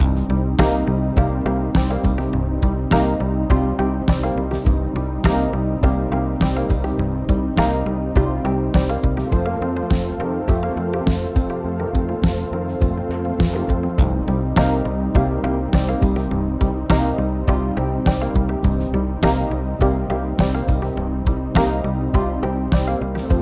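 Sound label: synth; funkastic; sampleson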